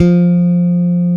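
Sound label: electric bass finger F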